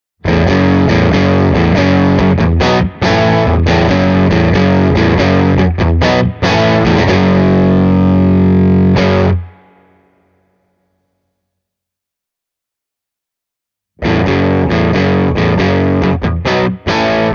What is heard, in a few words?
brunetti singleman 16 les paul lo fat vol 1 oclock power amp modes; Epiphone Les Paul (Volume at one o’clock)